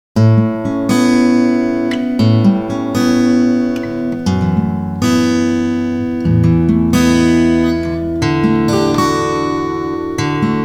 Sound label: morning comes softly